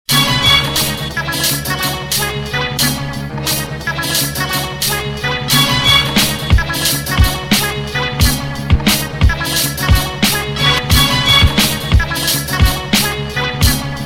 beat

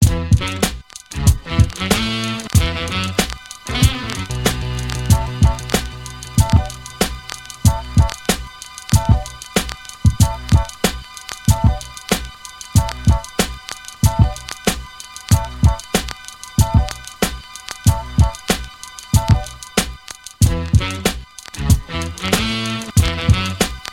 beat

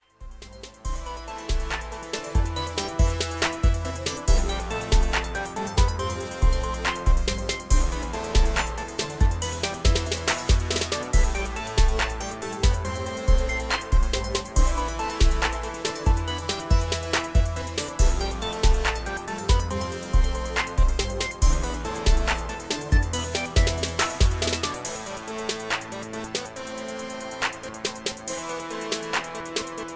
70BPM

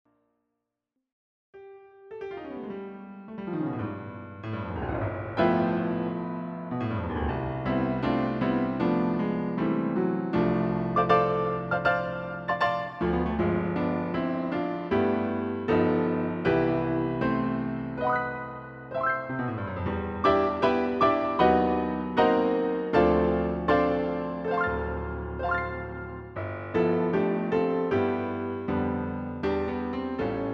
piano roll

